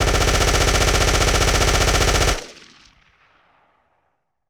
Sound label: Sub-Machine Gun 9mm Long Burst; Weapons & Firearms